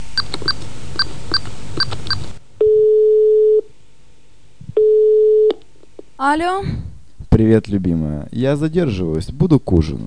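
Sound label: phone busy; DUKE RUS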